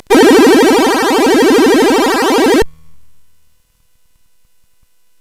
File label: Deviants, CSG8580R5